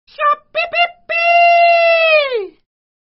Nhac chuong tieng ga gay; shopee

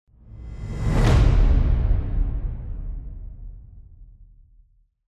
Tieng vut gay soc

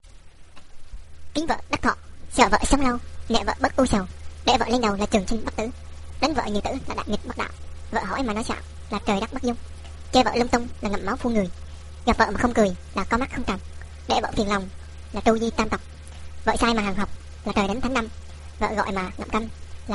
Nhạc chuông ninh vợ chipmunks

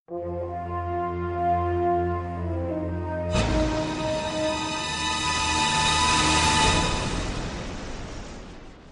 Nhac phim video cang thang hoi hop

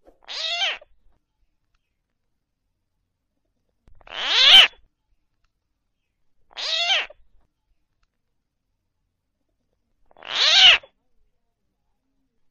tieng nhai dong keu

